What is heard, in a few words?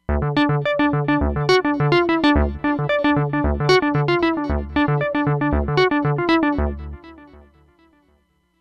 for Sequence, Roland Fantom XR